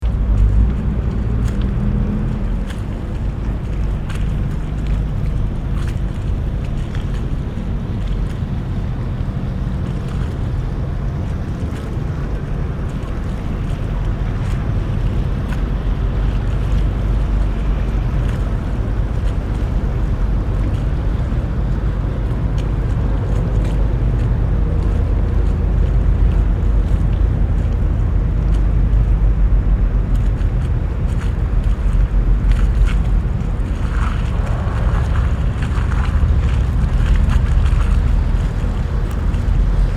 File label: Venice Grand Canal Traffic